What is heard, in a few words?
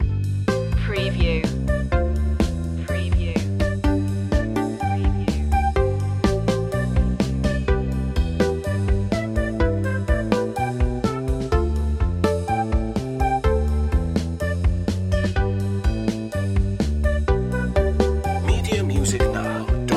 carefree day out loop